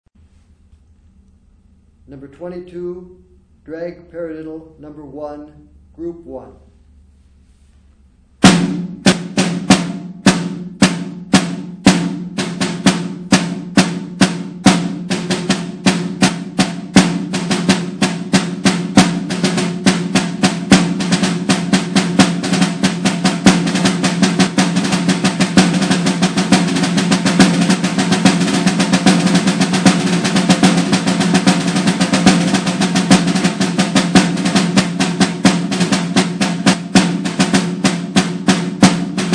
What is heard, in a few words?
snare rudiment